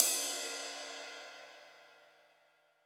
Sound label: RIDES; UNDERBELLY